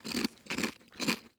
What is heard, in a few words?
eat; Rust